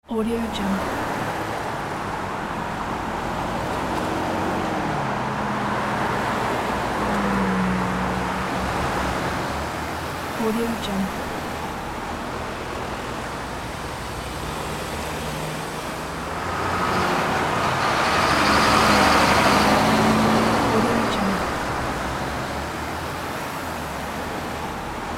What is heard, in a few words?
city traffic; woozle